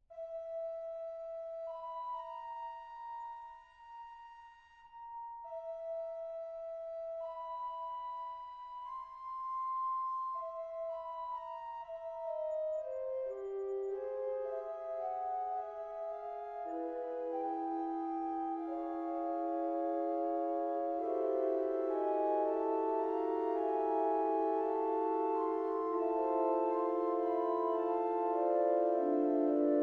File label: Flutes8; Litomysl; Wet; Litomysl Full Set 24 bit